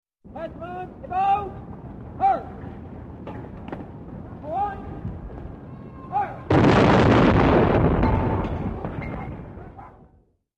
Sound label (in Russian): Komanda strelyajut i padaet gilza snaryada